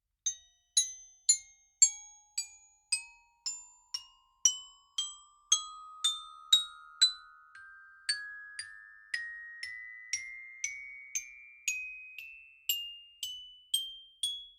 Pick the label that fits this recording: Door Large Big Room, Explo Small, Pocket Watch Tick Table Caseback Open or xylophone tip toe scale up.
xylophone tip toe scale up